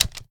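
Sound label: reload end fast shoulder, shotgun; reloads; iw8; romeo870; gmod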